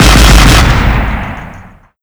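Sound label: infantry fighting vehicle burst; ifv burst; SuperPower 2 Redux V7A; unit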